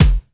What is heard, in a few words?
DUMM KICK, drum; Crypton - DrumBase5000